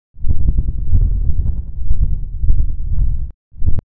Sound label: copter; momo; yo frankie